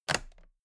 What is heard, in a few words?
toolbox latches close heavy; industrie wirtschaft; handwerk